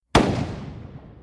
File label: grenade